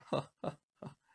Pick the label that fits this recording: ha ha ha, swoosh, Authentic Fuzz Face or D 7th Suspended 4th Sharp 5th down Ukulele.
ha ha ha